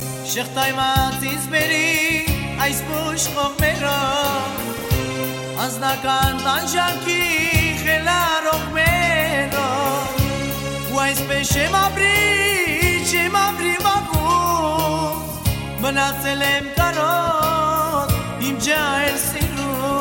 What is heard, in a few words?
Rabiz